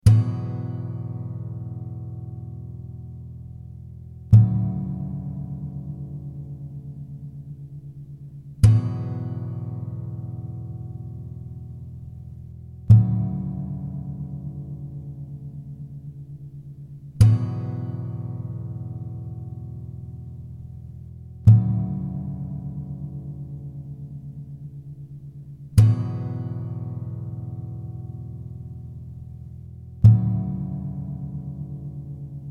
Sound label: E112 Two Chord GTR motif